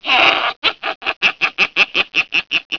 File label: LAUGH